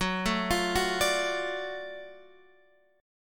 F sharp Minor Major 7th Sharp 5th F sharp down Guitar Half Step Down